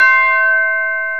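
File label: BELL C4, percussion; AKAI S-Series CD-ROM Sound Library VOL-8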